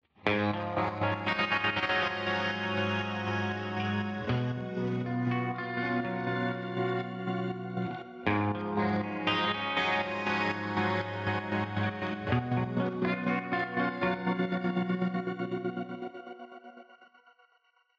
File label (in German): Riff Pro Q 3 und Pro C 2